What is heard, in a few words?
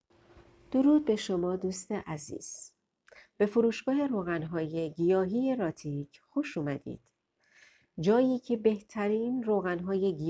vocals mixed; روغن شترمرغ; ostrich oil